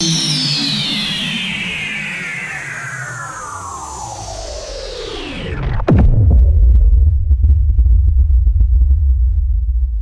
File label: synthesized sci fi power down descent